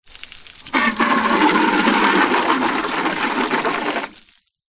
tin small empty no lid water spray into from hose; zapsplat

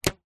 foley elastic band fire flick shoot

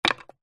stick small drop on paving stone; foley; zapsplat

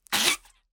cartoon squeeze squelch

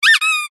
leisure toy squeaky

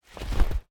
MONSTER Yeti snow footstep single

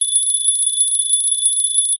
bells small timer ping constant loop

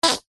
human fart very short; zapsplat

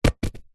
sport soccer ball flat light drop bounce concrete; zapsplat

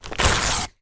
foley wrapping paper rip

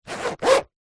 pouch small zipper zip fast hard; zapsplat; foley

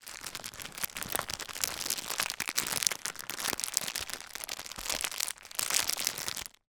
foley packaging wrapped item cellophane movements

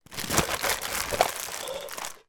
food sweets removed from tin

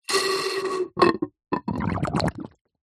nature water gurgle light shallow bubble

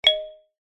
alert bell ping wooden

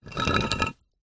foley heavy stone or rock scrape drag short

